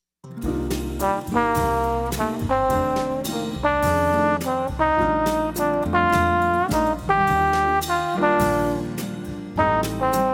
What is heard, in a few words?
interval exercise